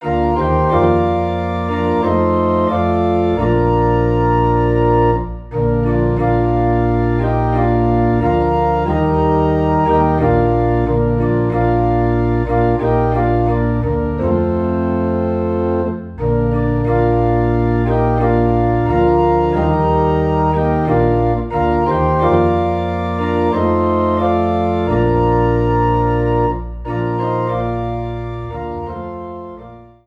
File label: Organ in B Flat